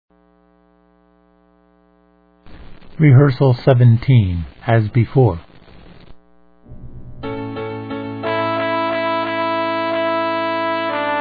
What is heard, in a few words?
cecilia tenor